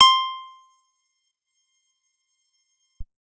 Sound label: guitar acoustic, acoustic guitar nylon